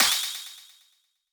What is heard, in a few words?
hit counter echo; battle; CrossCode